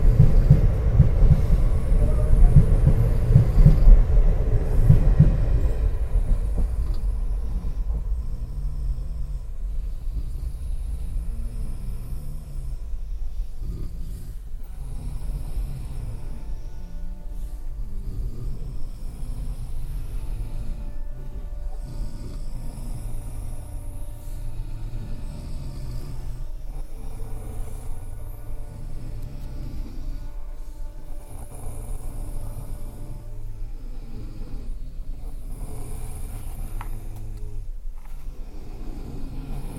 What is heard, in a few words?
night time chorus on sleeper train